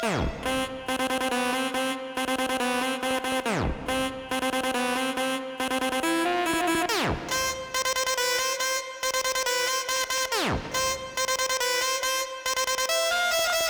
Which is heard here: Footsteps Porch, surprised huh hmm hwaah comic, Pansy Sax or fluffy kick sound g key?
Pansy Sax